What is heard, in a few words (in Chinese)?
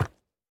山羊脚步声